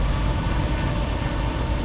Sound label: Plane Fire; DCS